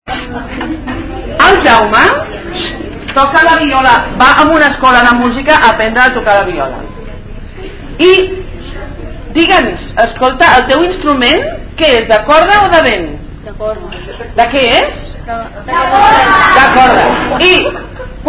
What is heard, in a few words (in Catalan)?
viola